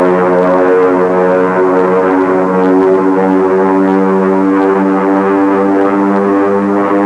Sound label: bomber, aerei; trasporti